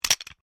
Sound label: Clacker wooden short, simple wooden persussion toys; Musical